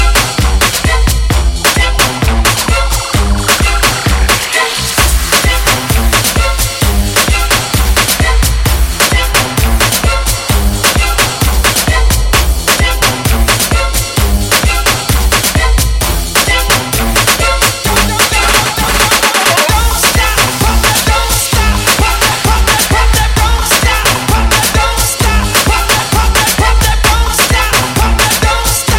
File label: sound latin; latino